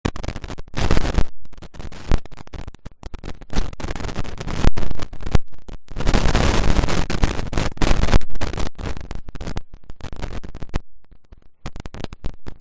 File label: riverside; Kyoto U; Ashiu